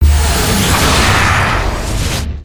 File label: Vanquish Blast; Sorcerer